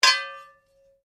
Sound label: Blade Heavy Moving Impact, Sword; Foley sounds for melee weapons; Swords (Sound Effects:Combat)